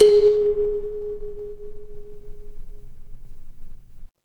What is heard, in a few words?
instrument kalimba